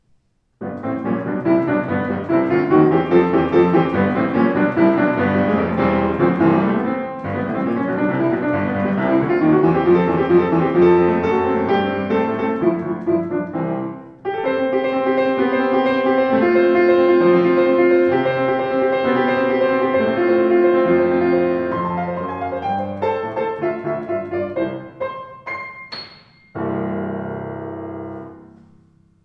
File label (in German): Schimmel Rag, Klavier; 112 schimmel nussbaum